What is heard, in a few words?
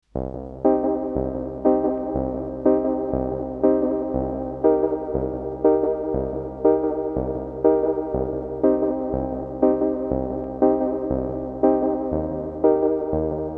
Piano Mod Delay; T-REX Replicator Module